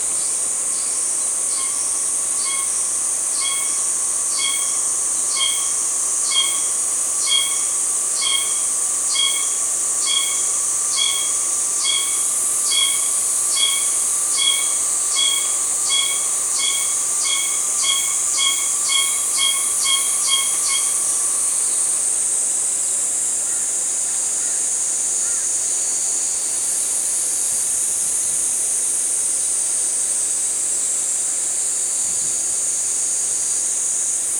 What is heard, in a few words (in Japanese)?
ohshimazemi